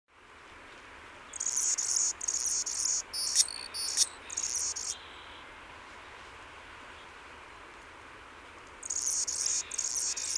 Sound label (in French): colibri d anna, chant